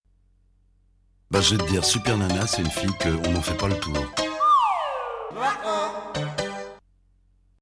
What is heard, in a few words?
jingle; supernana